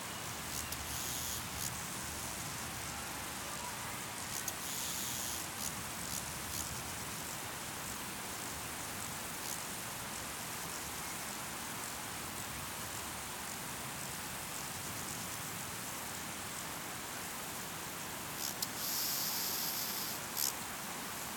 Sommerwiese; Geräusche der Sommerwiese

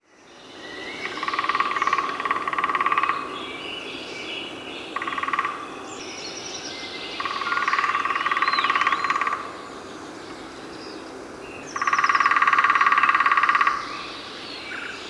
Kleinspecht; Der Kleinspecht ist ungefähr so groß wie ein Sperling und gilt damit als Europas kleinste Spechtart.

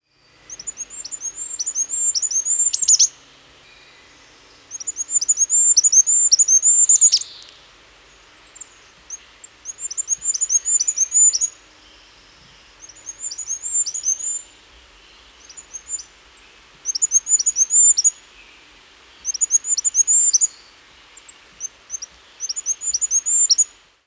Wintergoldhaehnchen

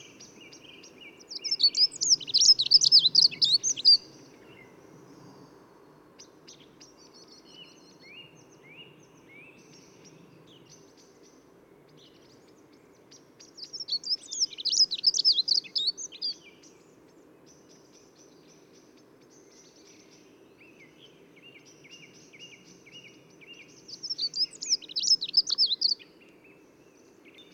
Heckenbraunelle; Heckenbraunellen sind im ganzen deutschen Sprachraum anzutreffen.

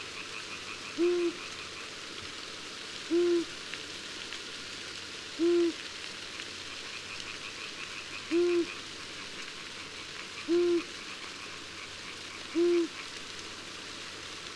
Waldohreule; Sommer

